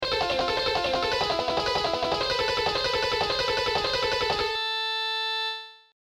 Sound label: Shredding Exercise